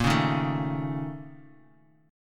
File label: A sharp Minor Major 7th Double Flat 5th A sharp Guitar; strummed; JGuitar